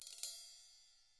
cymbal ruffs; ILIO; Double Platinum Drums 1

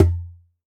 CONGA BATTER; Best Service ProSamples vol.55 - Retro Sampler; AKAI

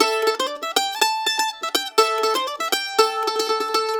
LICK, Blues Guitar; ILIO; Fretworks - Blues Guitar Samples; 120 CEE LICK

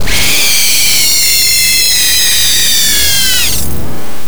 VOX FX L; Big Beat Samples & More

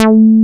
JNO SPIK A4, SPIKE BS

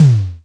tom, Tama TS-305

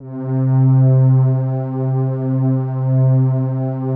PAD, DIGI-PADS

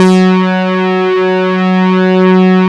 SYN OSC ARP, Microwave; Sound & Vision - Gigapack I CD 2

